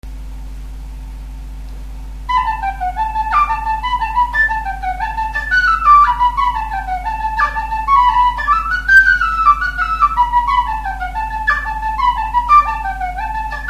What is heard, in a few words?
jig, whistle